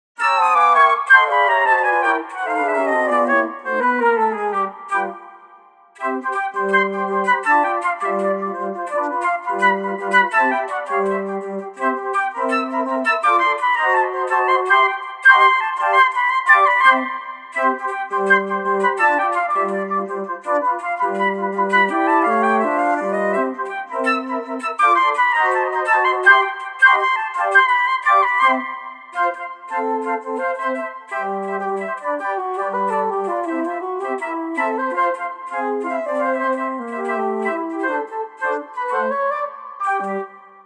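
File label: FLUTE QUARTET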